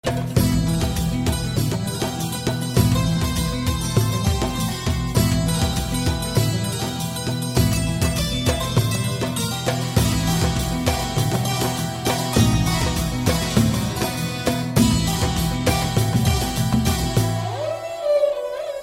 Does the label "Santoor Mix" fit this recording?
Yes